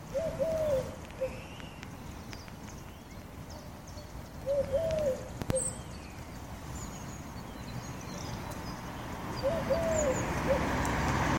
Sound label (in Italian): Tortora Orientale